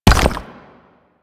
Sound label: Nechro Mech Step Surface Rock; Step Surfaces